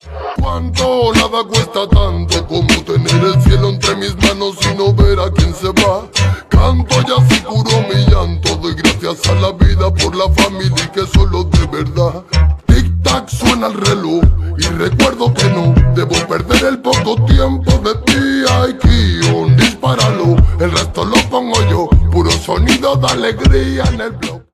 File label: slowed